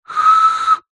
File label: office stationery felt tip pen lid air blow whistle